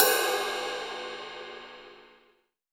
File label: Ride, Cymbals; Vengeance Samples